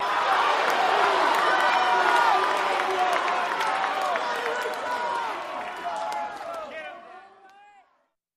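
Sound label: Crowd Cheers Short Burst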